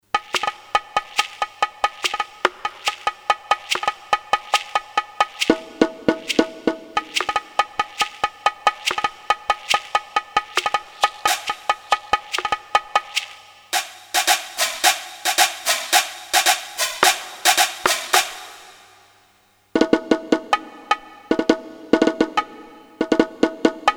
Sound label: Korg DSS-1 Latin Percussion; BON&MAR1